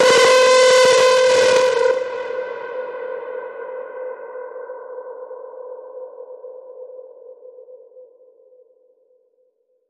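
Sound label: Alarm Horrendous Clang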